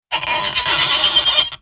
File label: cartoon squeek